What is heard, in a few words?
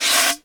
Fire Extinguisher Stop; Rail Jack